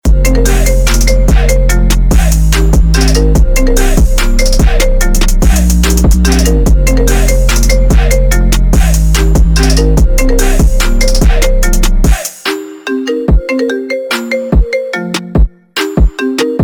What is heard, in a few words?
Original Ringtone; iphone 8